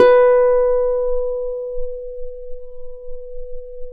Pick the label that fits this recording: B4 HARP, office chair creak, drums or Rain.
B4 HARP